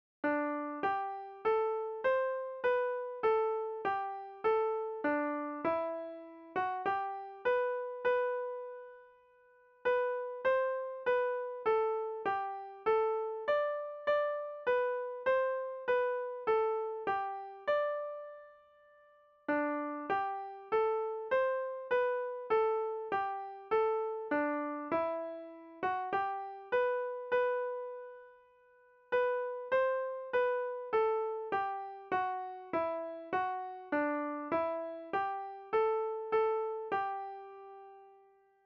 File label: hymn melody